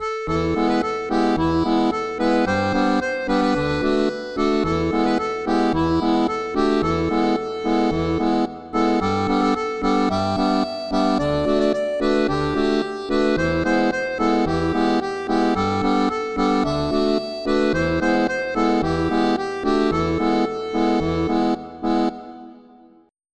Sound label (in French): Folk ecossais, Tablature pour diato. à 3 rangs; Jacobites by name; accordeon diatonique